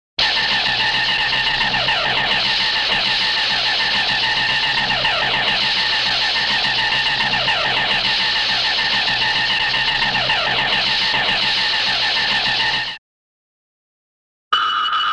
Sci Fi Heat Ray Sci Fi Space Ray Sci Fi Omega Beam; Darkseid Omega Beam